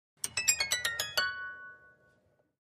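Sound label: Harp Slow Short High Strings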